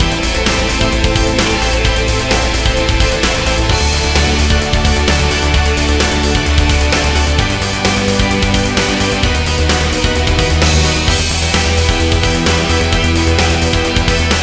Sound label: sinking ship loop, zombie soundtrack